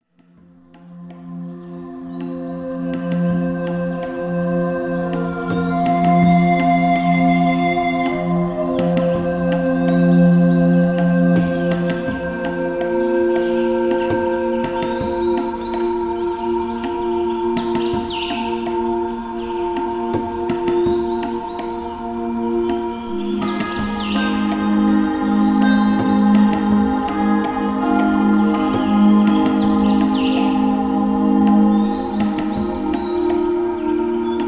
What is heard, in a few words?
Hindu Nature Sounds